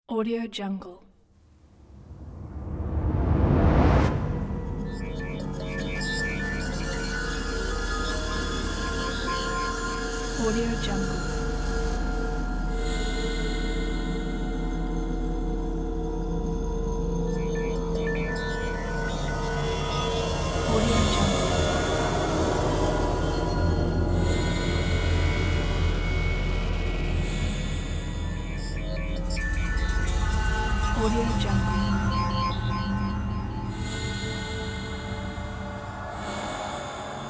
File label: soundscape space